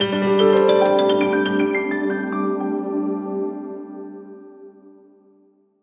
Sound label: Piano Arpeggio Airy